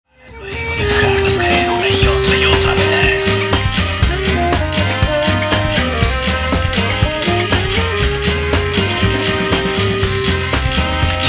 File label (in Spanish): ritmo local